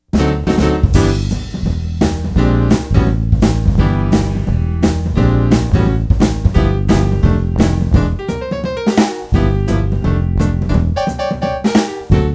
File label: swing